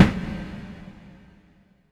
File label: SHORT, SHORTNOIS